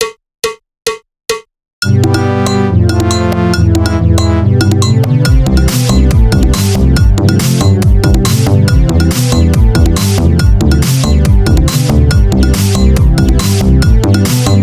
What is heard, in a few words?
Conga Pony Version